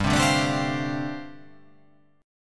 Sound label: G 9th Suspended 4th